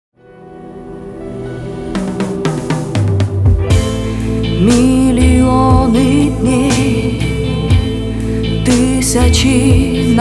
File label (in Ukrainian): Зведення вокалу